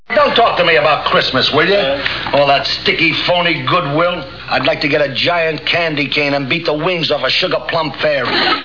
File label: beat wings; Schuetzenchor